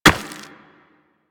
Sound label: Run Rock, Footsteps; Warframe; Operator